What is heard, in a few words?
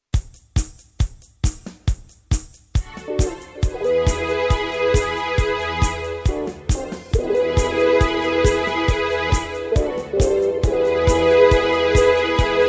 Electric Guitar